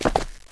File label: pl jump, player